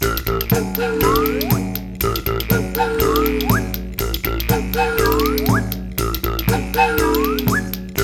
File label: 120, African Contemporary; Spectrasonics; Supreme Beats; Bash Novel